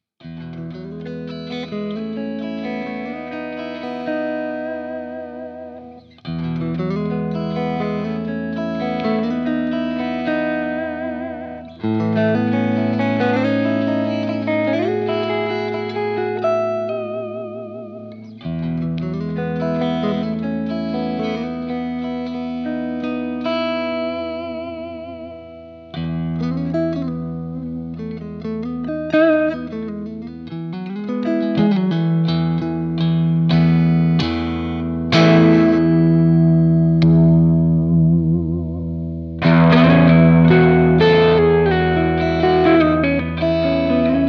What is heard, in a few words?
Tube Solo